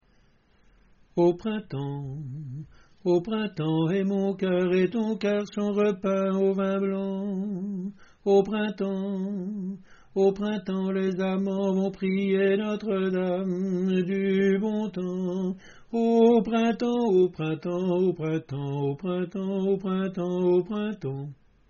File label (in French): coda alto; au printemps